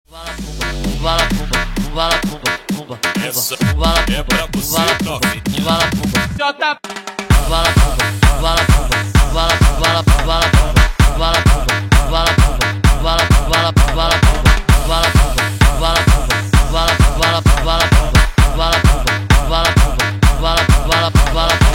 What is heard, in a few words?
music beat